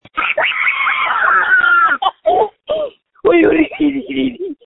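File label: screams that feature laughter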